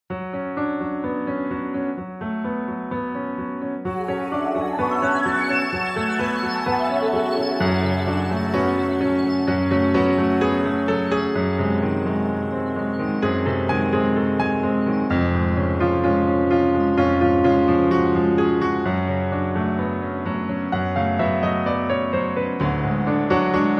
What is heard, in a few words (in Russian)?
Минус Piano